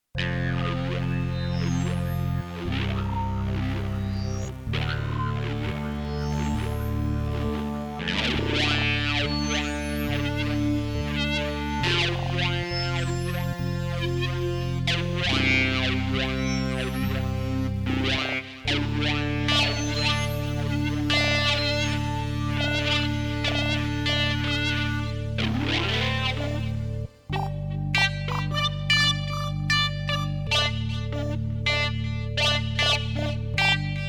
electric techno

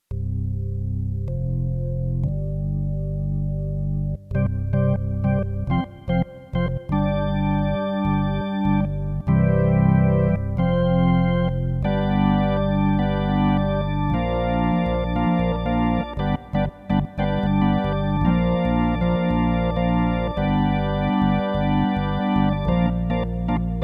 organ

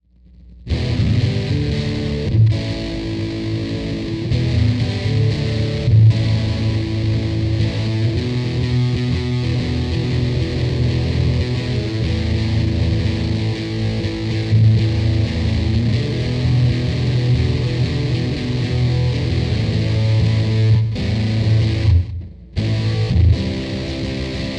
electric; Half Cocked

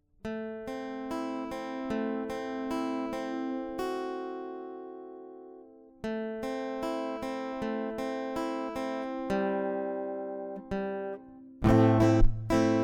Acoustic; MegaEpix

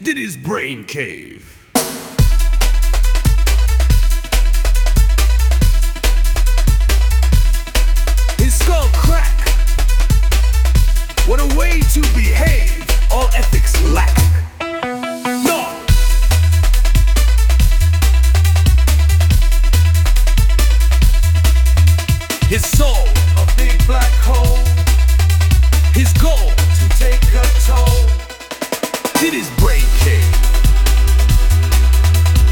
Reggae

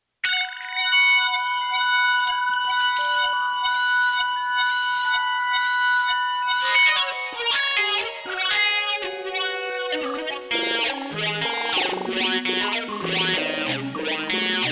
electric intro

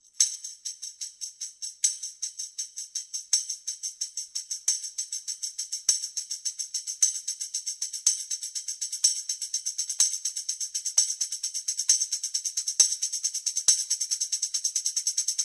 tambourine; MegaEpix

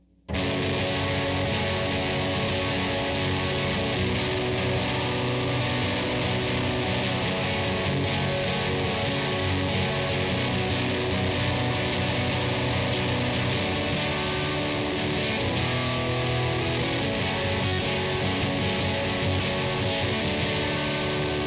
electric

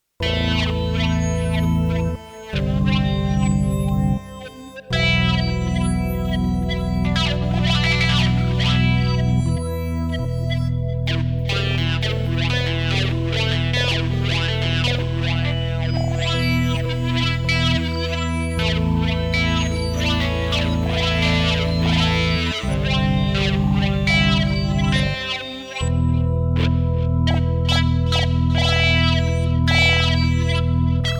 Synth FX organ

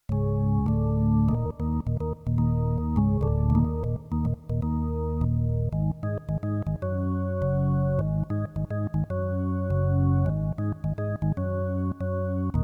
Whats to Come electric

